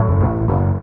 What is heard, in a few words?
ORG RIF; E-Lab; X Static Goldmine 2; akai